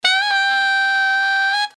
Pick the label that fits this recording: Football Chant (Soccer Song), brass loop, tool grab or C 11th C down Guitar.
brass loop